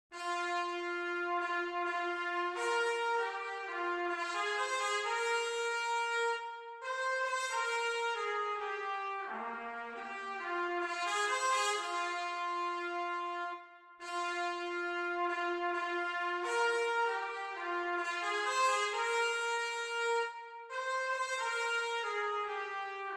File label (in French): Trompette